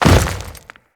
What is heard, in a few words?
Step Dirt, Footsteps; Grineer